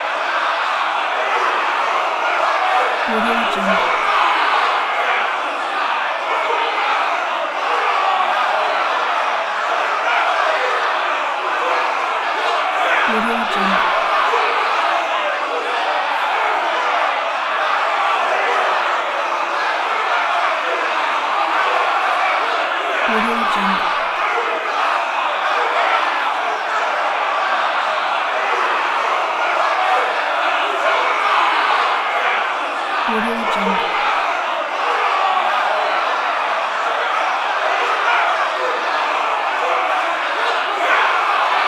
walla mob fight; prosoundfx

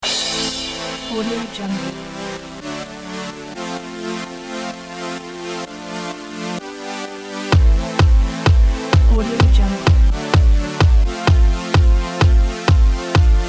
dance loop

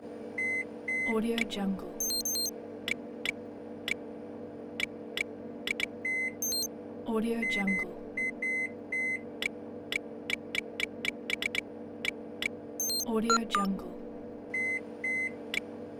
computer communication device

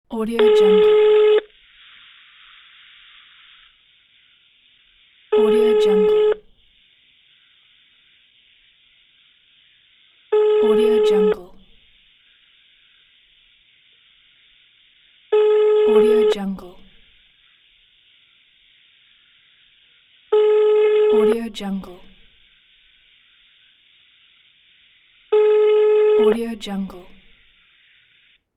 calling; teldrassil

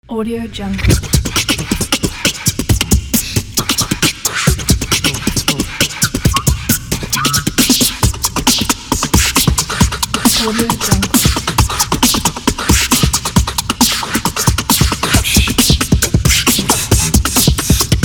beatbox

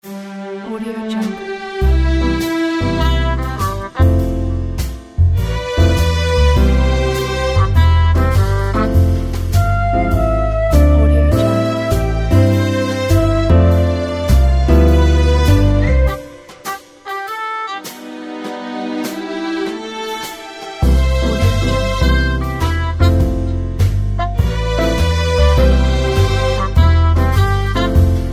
sweet swing; anystylex

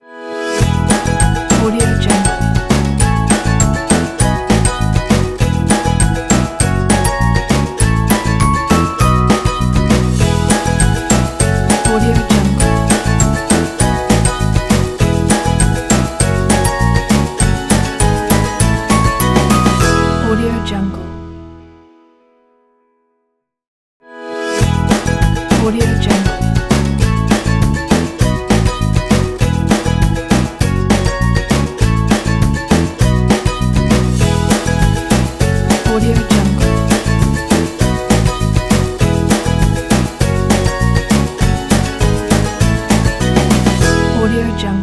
happy ukulele children logo

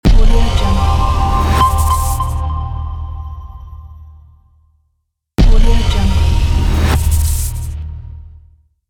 hightech short glitch logo ident

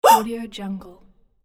huh vocal

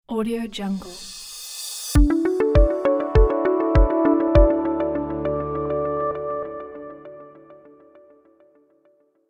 motivational logo ident intro

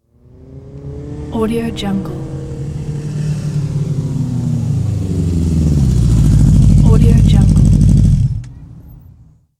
motorcycle shut off

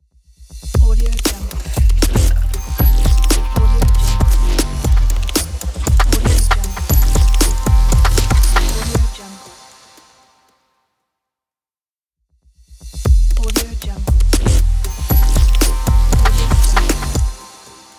technology logo

